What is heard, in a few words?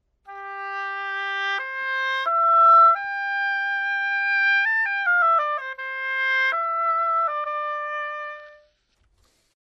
OB Sample Dry